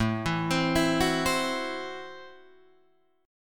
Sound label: A Minor 6th A Guitar DADGAD